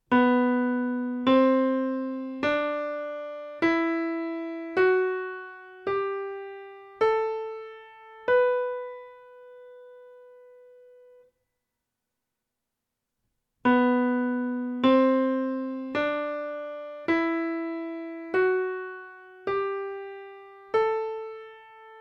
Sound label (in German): Tonleitern hoeren